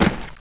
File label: flesh, debris; XDM